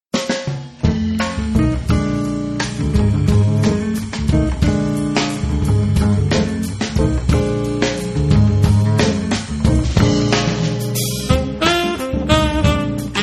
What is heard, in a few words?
jazz